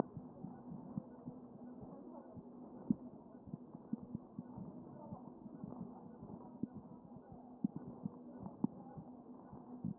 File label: CHEST pos1 D MODE